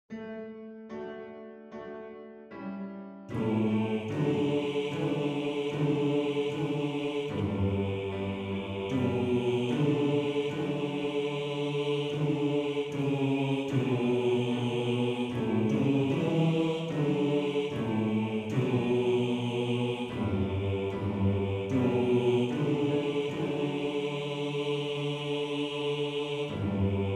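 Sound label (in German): Hör in den D Bass 2, Männerchor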